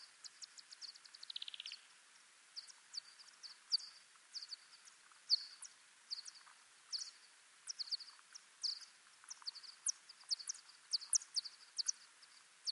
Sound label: Little Brown Yuma Bat; McKennaBrg